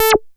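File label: SHORT ACID, BASSES; Masterbits - Vintage Voltage; VV BASSES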